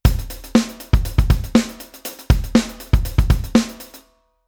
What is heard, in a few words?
Rhythm 16beat